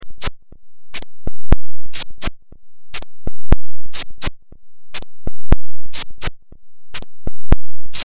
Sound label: fm7 patches